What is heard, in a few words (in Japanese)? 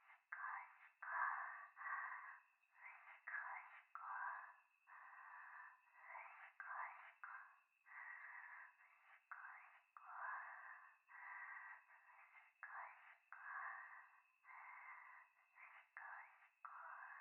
風呂 しこしこ 山奥夜